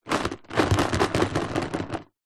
foley container plastic compartments few lose items shake; zapsplat